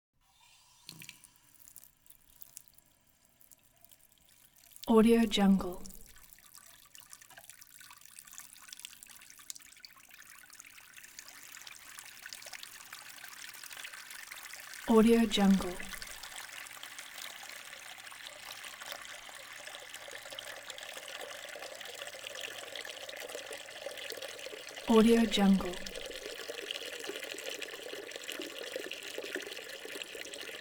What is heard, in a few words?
water running filling sink; hollywoodedge